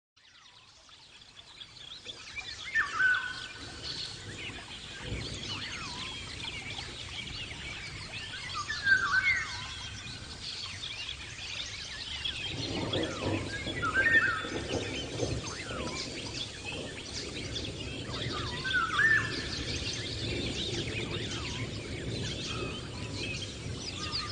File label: tropical bird sounds